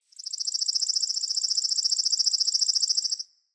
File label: Forest Open Glade Bird Close, Day